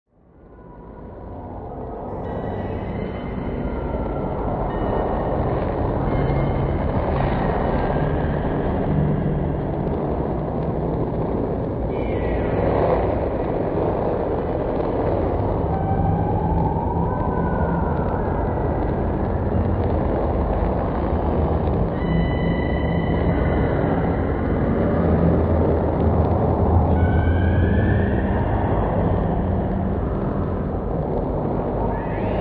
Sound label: Drone Creature